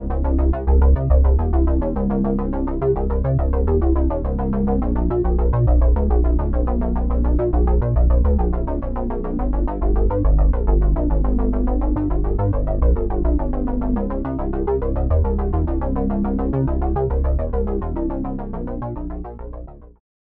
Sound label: bass hollow line